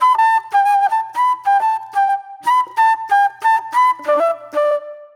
Mellow Flute

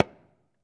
Ritual Drums, Drums Percussion; E-MU Producer Series Vol. 7 – Old World Instruments

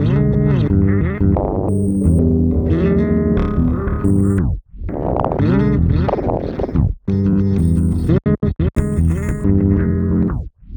Processed Electric Bass

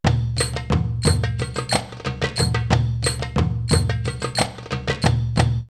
Telly Dance 90, drumloops World Ethnic; X-Static Goldmine

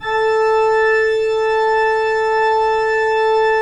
PIPE, Organs; E-MU Producer Series Vol. 5 – 3-D Audio Collection; 3D Pipes Dr Joyce

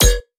metallic Riddim, bass

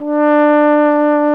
tenorhorn D2; BRASS; Best Service; Alpine Volksmusik

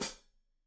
Hat Foot, Hi-Hat, Cymbals Stereo

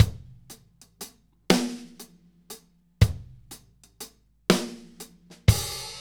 6/8 Loop, Drum Tools, Laid Back; Sonic Foundry (Sony Creative Software); Loops & Samples Drum Tools

